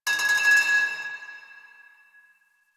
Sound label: Pipe Cement Drop; Warframe; Ambience; Nineteen Ninety Nine